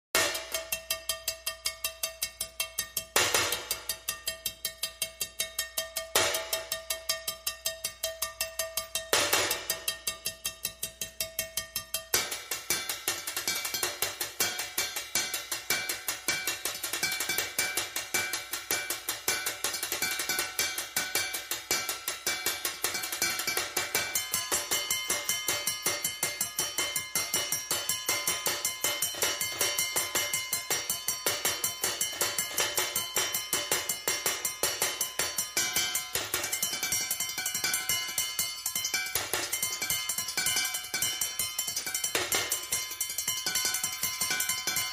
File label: High Drums